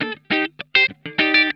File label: SLICE, Funk Guitar; Zero G - Funk Guitar